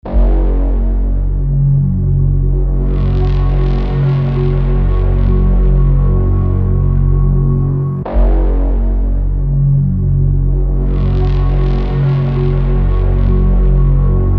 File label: edm pack